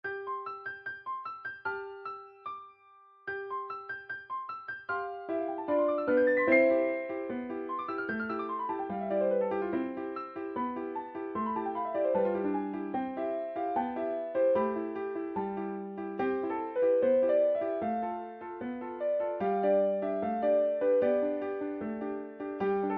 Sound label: Trailer Theme; wii; Kirbys Epic Yarn